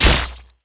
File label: metal medium slice flesh